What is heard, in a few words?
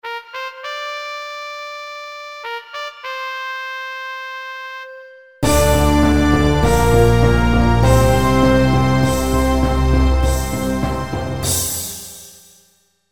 orchestral theme